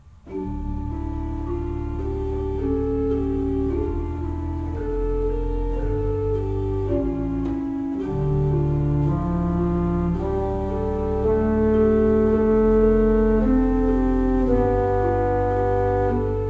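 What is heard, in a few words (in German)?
Rohlfing Kreienbrink 8 II P, pfeifenorgeln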